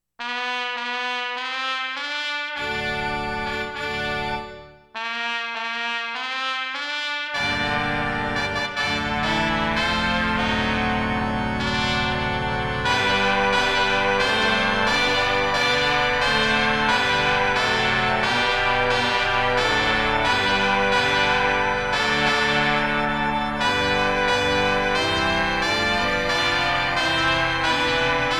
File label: Wedding Chorus Ode to Joy Midi; wedding suite